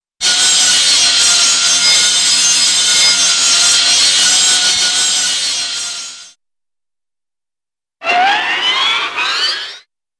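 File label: High-pitched Electric Saw Cutting Steady - Electric Motor, cartoon; Hollywood Edge; Cartoon Trax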